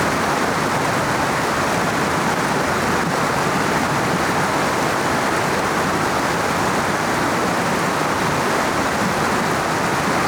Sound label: Waterfall